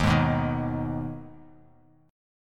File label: Eb Suspended 4th Sharp 5th; Ebsus4#5 strummed; JGuitar